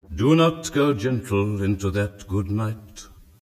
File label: adsyn